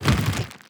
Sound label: subtle, zombie blind, foley; creatures